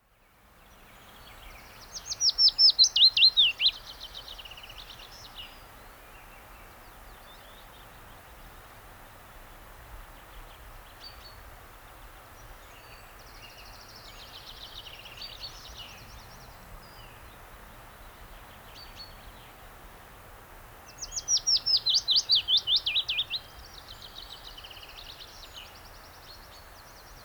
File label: Willow Warbler